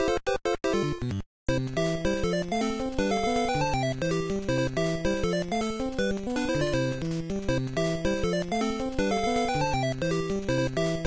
Autoscroll; SML1

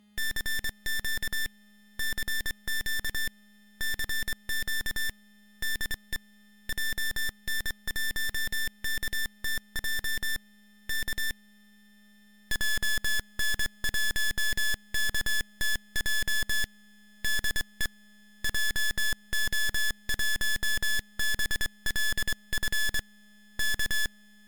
Morse Code; Gokuraku Chuuka Taisen

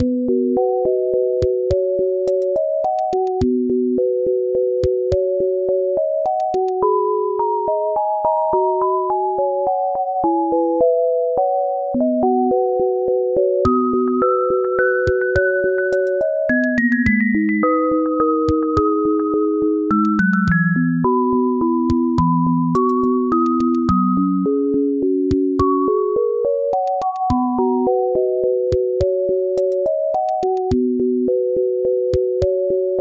Lullaby unused; Insaniquarium

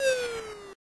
Shrink; Sonic Mania

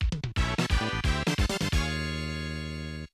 Unused Jingle; Super Goal

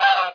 SKY GOOSE CALL; Frogger 1997

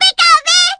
Pikapi

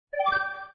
Bicep Pump; Mario Tennis- Power Tour

